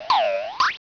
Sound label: jump